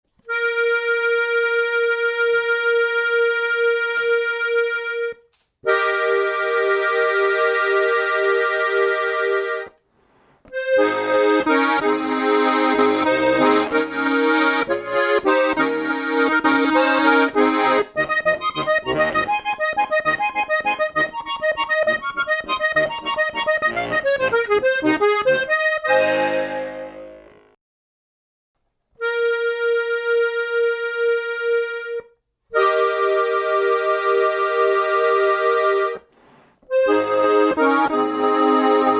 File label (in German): ziach 20 Mittel